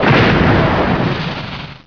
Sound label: EXPLO